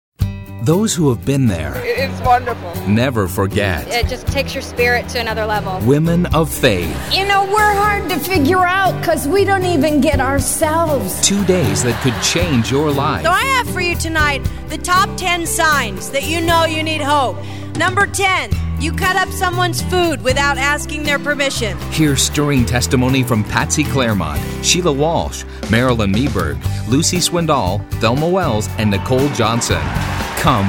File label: laughter